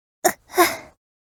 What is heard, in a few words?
grunt